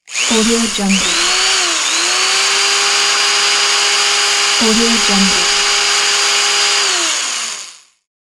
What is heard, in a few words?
electric drill